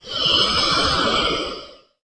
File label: moan; The Elder Scrolls III - Morrowind